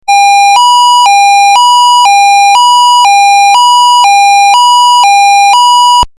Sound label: ambo medium